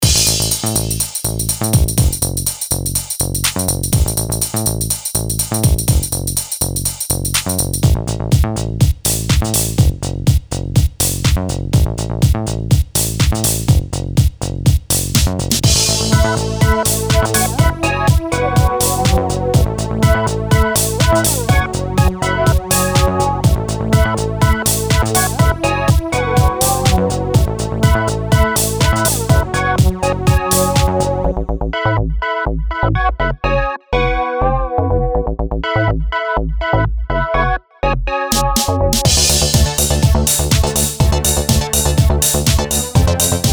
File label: beat; bpm:120